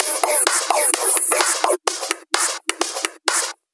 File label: House Loop, 128 BPM; Vengeance Samples